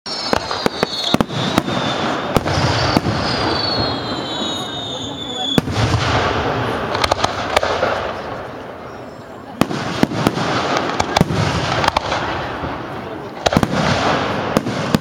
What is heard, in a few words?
Fireworks; Gold Coast Hash House Harriers; Southport